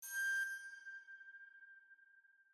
Alchemy Flute Combo

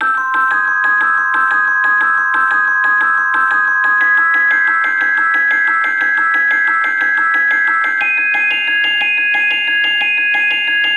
reveal music, Magical Forest

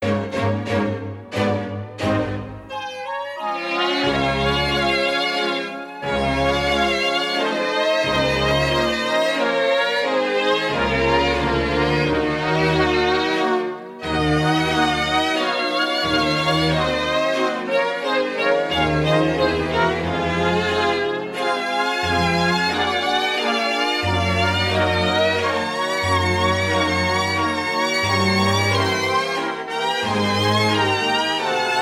A Waltz; paper mario the thousand year door complete

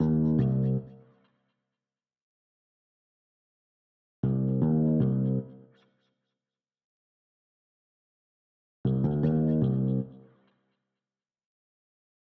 Fight Background; We Happy Few

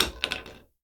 Drop Hammer

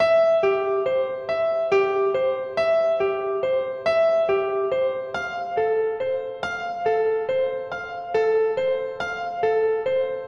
bgm game boss; Kaminazo Mirai kara no Omoi de

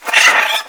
mole

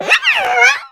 bug; bug catcher

